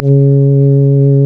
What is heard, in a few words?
REED C#; Big Fish Audio; Synclav FM